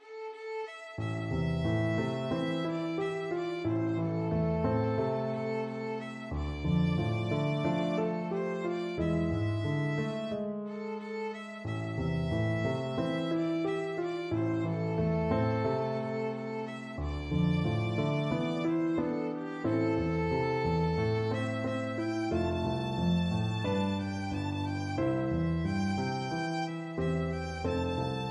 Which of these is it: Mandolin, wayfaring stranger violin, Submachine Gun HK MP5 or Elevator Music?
wayfaring stranger violin